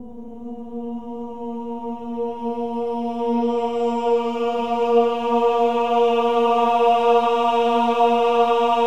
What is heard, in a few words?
OH-AH A#3, choir